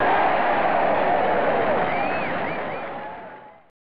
Crowd; PGA Tour Golf; SNES

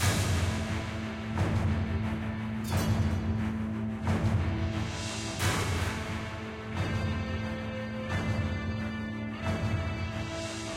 Destruction Boss; E01 Great Wall

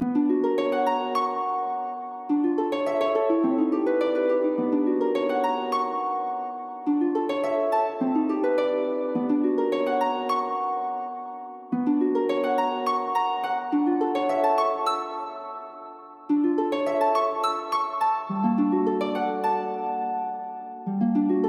harp; Cloud Harbor harp; Gnomes vs. Fairies

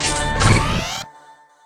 Teleporter Activate

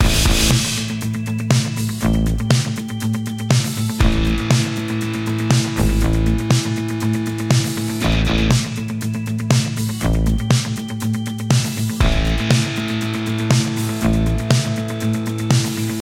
Training Level 2 Loop; Quest Rookie Med; club penguin island; android

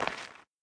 dirt footstep

